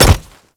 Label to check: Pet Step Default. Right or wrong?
Right